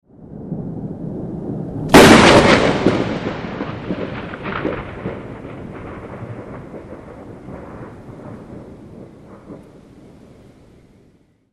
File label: lightning ringtone